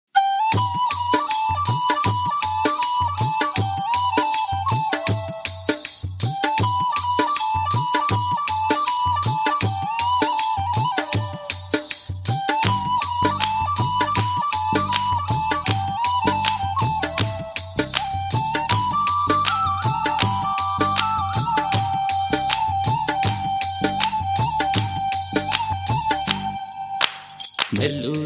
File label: Ringtone